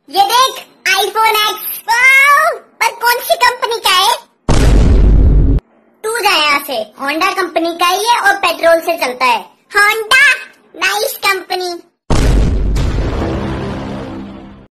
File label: funny ringtone